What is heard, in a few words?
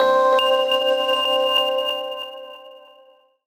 VIB WHIZ FX; Rawcutz; Nu Forms